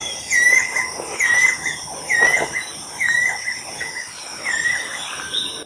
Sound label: Banded Kingfisher calls Sepilok Sandakan Sabah Borneo Malaysia